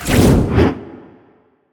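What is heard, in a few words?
mystery symbol animation start; goldengrimoire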